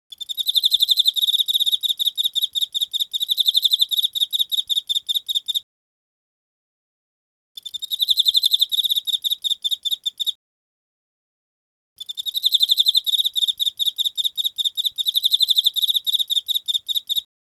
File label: emmakoorogi, insects; songs of insects